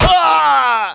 die; outlaws

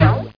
shoot ball; delirium

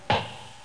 ff hit

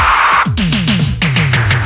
Power

